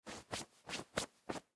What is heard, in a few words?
Cotton Shirt Hand Brush; Brushing; Human; foley; Sound Effects:Human; Blastwave